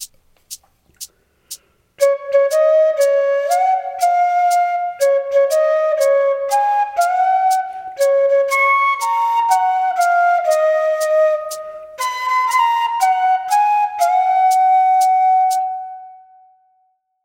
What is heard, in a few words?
Happy Birthday Flute; Bansuri Student Zone